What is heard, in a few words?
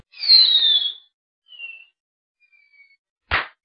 bottle rocket; Sound FX Real Life